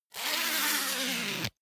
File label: zipper pullover jacket close; objekte; reissverschluss